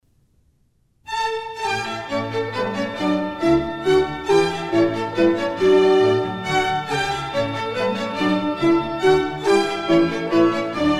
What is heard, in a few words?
Minuets, D major; Mozart; Dances and Marches